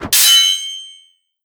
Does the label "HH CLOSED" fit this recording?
No